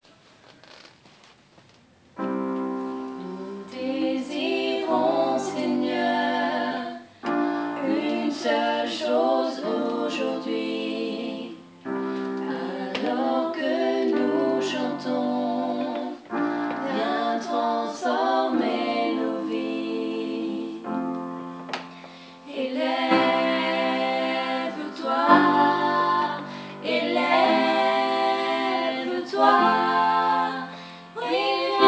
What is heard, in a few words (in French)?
voix mix eleve eoi